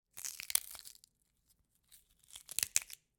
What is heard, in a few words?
gore snap; Sonniss GDC